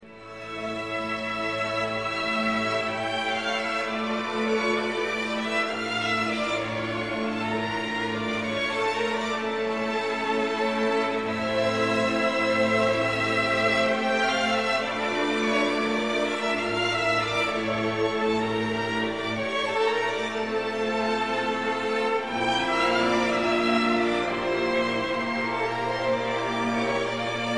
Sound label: Seven Romanian Folk Dances for orchestra; Bartok